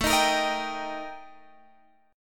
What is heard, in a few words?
Eb Major A; strummed; JGuitar